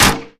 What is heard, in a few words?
sheetmetal small